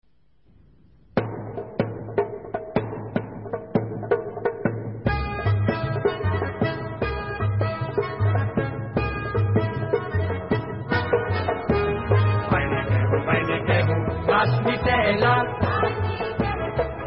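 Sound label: Instrumental, Israeli Dances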